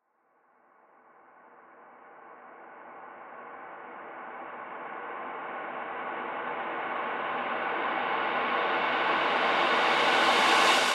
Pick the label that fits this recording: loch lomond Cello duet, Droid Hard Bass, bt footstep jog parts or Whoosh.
Whoosh